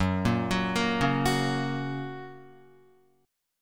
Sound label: Gb Major, Guitar; Gb arpeggiated; JGuitar